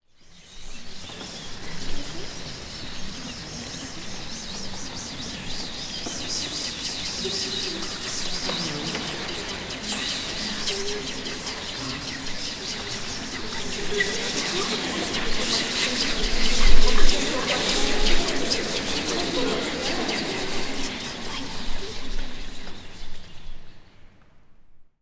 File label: CHATTER